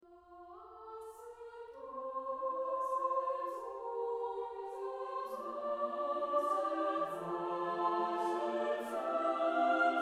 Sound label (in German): Bass2; Brahms